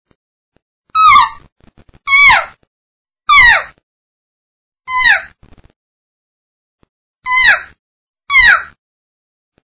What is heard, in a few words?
cow, elk; elk contest; elk 2001